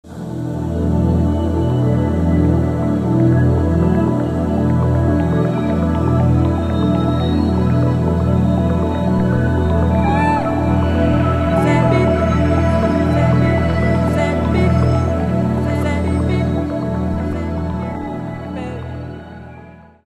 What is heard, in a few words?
music ambient